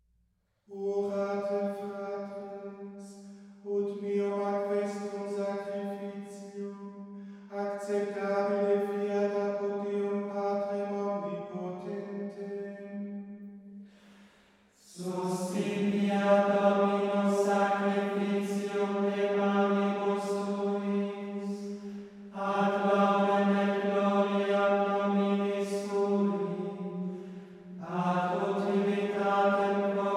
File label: Ordo Missae in Lingua Latina Ad Offertor, Chant